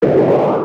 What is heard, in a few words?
charge; 補助魔法2